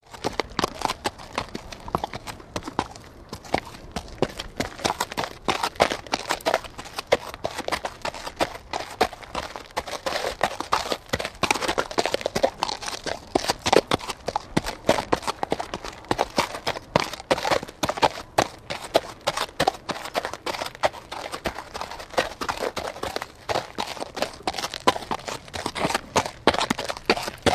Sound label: Horse Walking